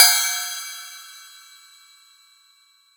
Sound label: cymbal shorter